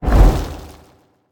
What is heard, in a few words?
projectile near miss